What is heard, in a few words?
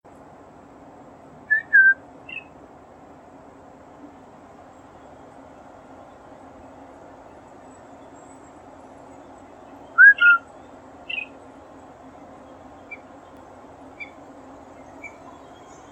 white-throated bee-eater; bird-watching